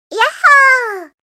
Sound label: yahoo